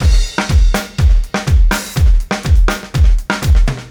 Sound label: Straight Kit, World Pop Beats